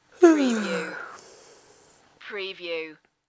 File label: Yawning, Female Yawn